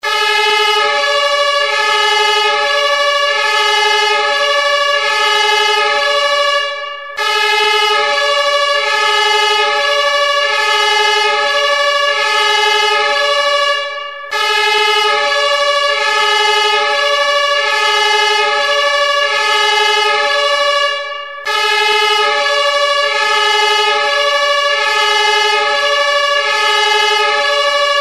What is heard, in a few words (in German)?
feuerwehr martinshorn